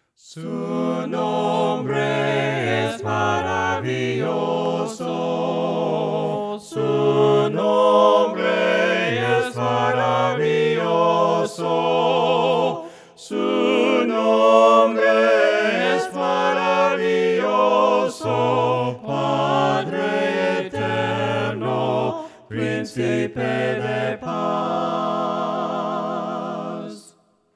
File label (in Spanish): VOCES, himnos a capella; cantos del camino